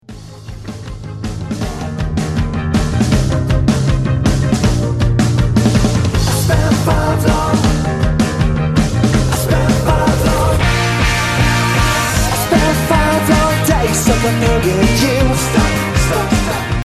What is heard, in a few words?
indie synths